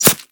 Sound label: Arrow Impact Flesh; Warframe